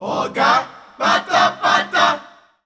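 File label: Rhythmic Chants, Voices Of Africa, 90 bpm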